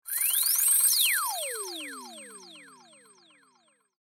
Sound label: Laser Pistol Cartoon Blast; Laser Pistol Blast Ascending And Descending Cartoon Scifi Science Fiction; Lasers